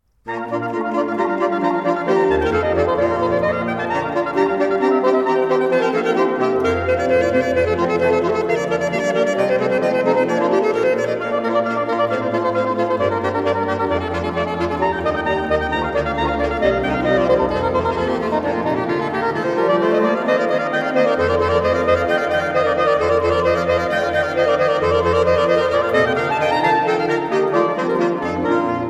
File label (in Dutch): saxofoon, saxophone quartet tape reel to reel